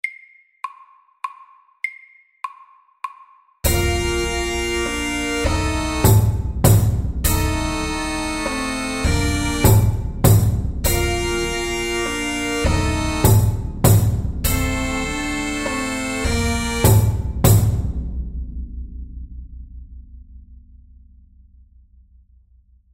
Stomp FAST

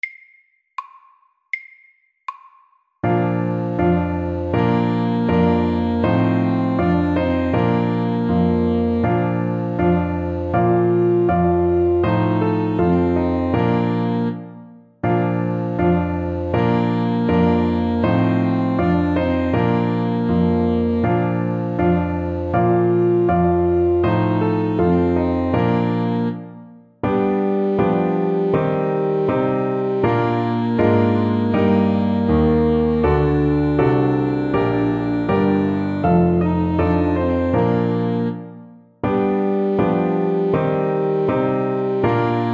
Ecossaises, 80

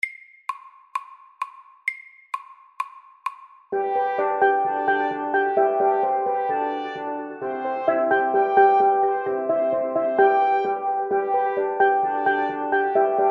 Steel drum band; FORT

